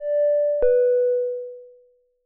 elevator chime; teardown ps5 windows xbox series xs gamerip 2022

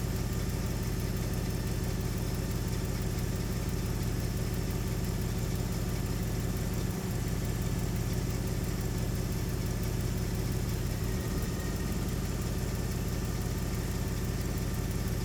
vehicle classic idle

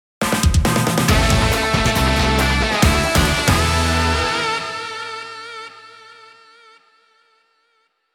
Power Activation; Advance Wars 1+2 Re-Boot Camp; Sami's

